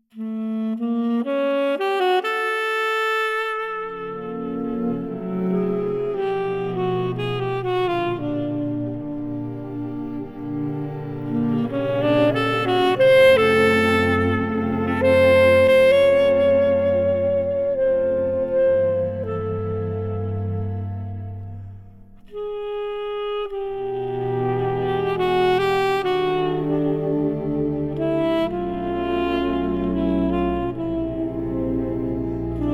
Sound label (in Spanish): Saxo + Cuartetto